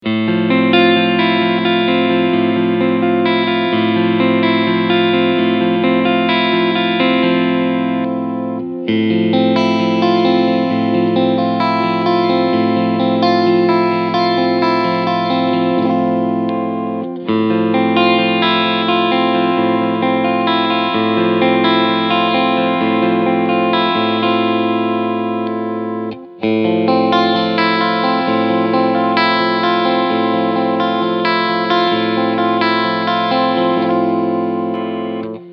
Legacy alle Cleansounds; GL Tribute Black

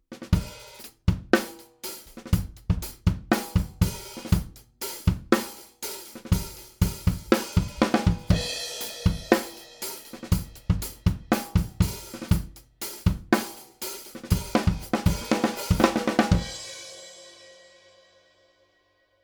AMS Neve 33609N Drum Bus Parallel Kompression

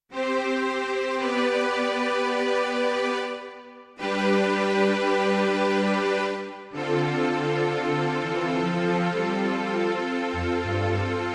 Streicher

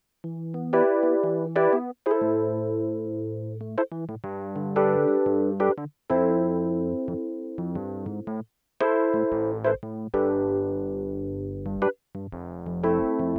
Rhodes mit Chorus Flanger

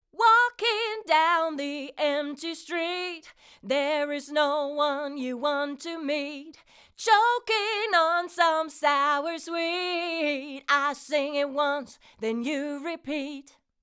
UK Sound Vocal ohne 276

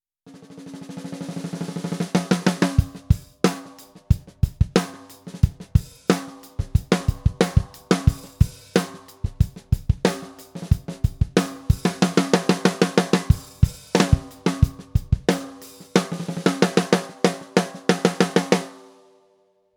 Stahl tief offen

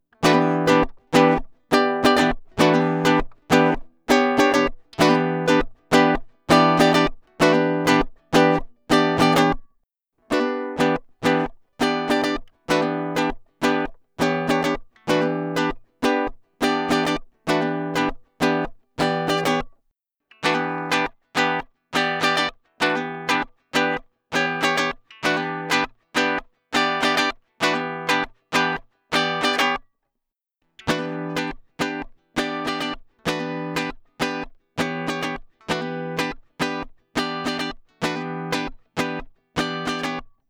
Acc Dry comp Bright Bass High ohne Bright; Ibanez

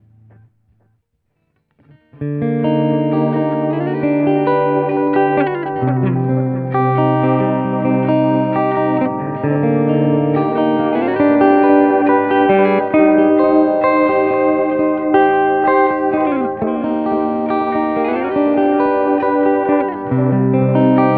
Dreieckswellen

